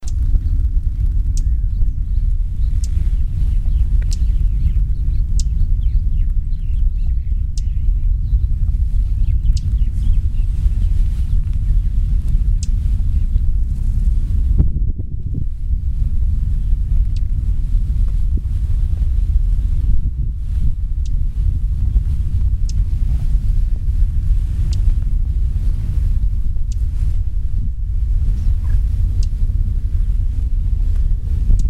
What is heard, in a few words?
warbler dusky Phylloscopus fuscatus